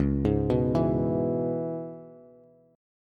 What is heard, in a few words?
C sharp Minor 7th C sharp Bass; C#m7; arpeggiated; JGuitar